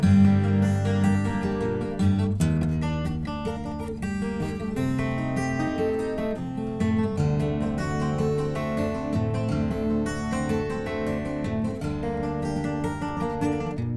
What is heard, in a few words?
4th fret capo 1 doodle; guitars